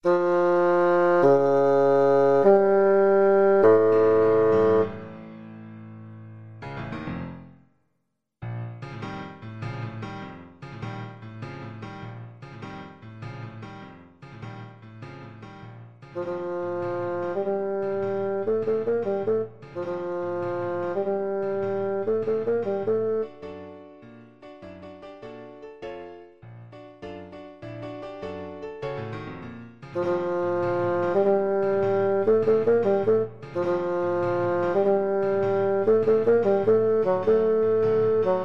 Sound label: bassoon